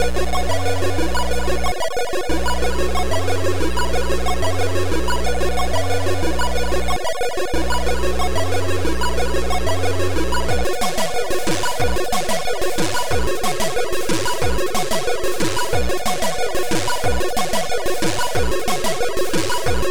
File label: BASS 2